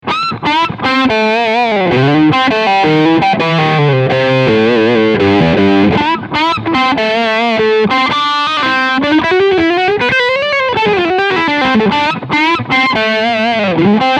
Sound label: Crunch Lipstick